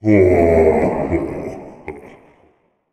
Reaper Attack Vocals

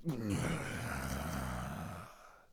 Death Vocals; Necromancer

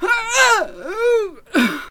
Death Vocals; Sarissa

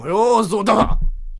Monk Attack Vocals

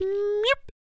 Chariot Attack Vocals

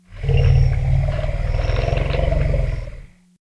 Mammoth Attack Vocals

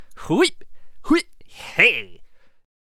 Ball and Chain Attack Vocals

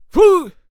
Protector Attack Vocals

